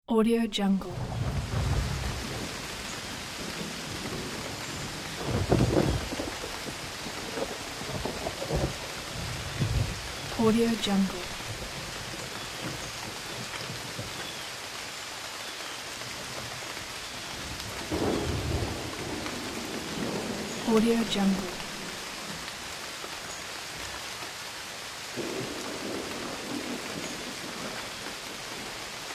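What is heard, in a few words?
rain and rumbling thunder in forest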